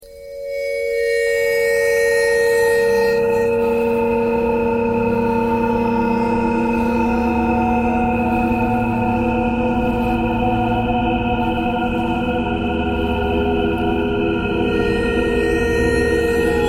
traffic kcore birds a ice bowed vibes thread pentatonic; bowedmetal